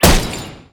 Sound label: Axe Metal, Damage